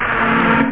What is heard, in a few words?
SAND; OctaMED SoundStudio V1; rave